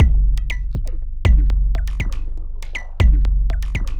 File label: Robot Thunder, Modular Electronica; Beats; Sonic Foundry (Sony Creative Software)